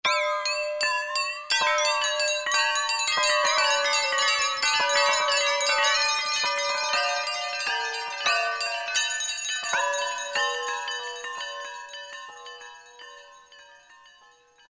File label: Water Bells; Roland MT-32